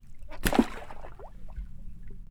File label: Water; TOOLKIT vol.2